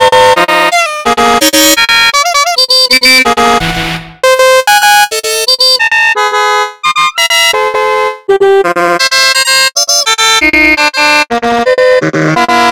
SFX Horns Passing; Mario Kart 8

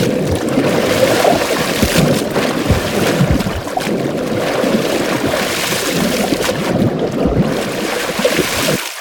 water left right; Rapids

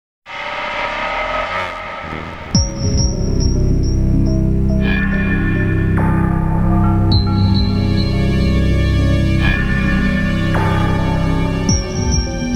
sneak-up synth; I My Me! Strawberry Egg

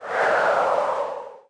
Wind

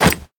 Footsteps Dirt Run; We Are The Dwarves

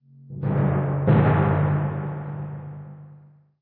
Tymp Hits

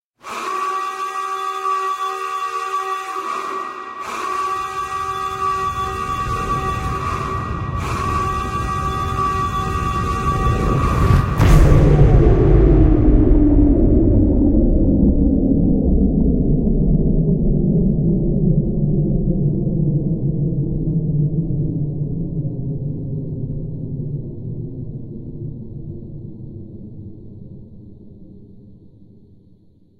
Power outage Alarm; Criminality

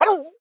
frozen vocal; Scrat

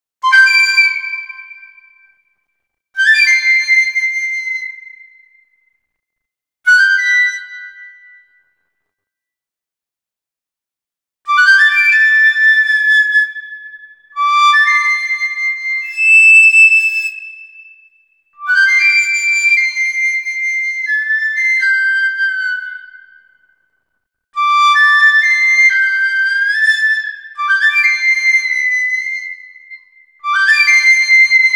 Flute; burly men at sea ios macos ps vita ps4 switch windows gamerip 2016; Fishing Village

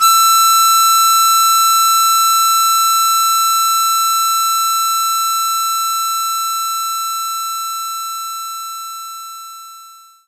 Harmonica E5

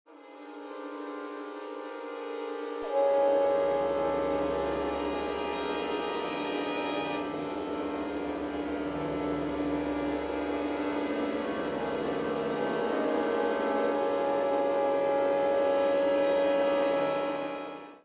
Stringy; undead overlord linux macos windows gamerip 2014

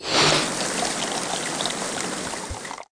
Scrolling Spout; lego my style preschool

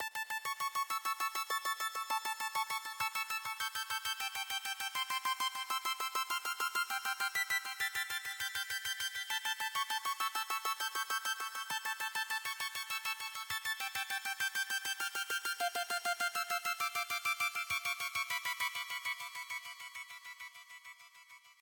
Melody Synth; nova 111; ps vita

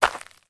foley footstep single dirt

